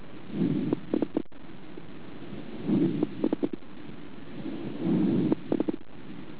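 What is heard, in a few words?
coarse rales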